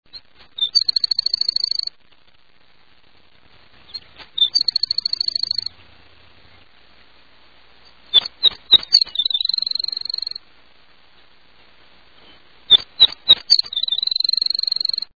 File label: Bairds Sparrow